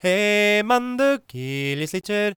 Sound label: GAELIC, World Voices; ILIO; Vocal Planet